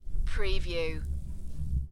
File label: Billiard, Pool, Snooker - Close up sound of ball rollling on felt